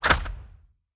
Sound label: scorpion attack claw; smite; npc